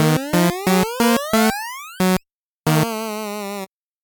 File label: 8bit jingle slow